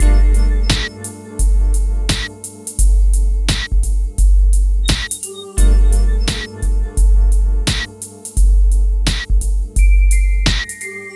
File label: MIX, Hip Hop and R&B Drumloops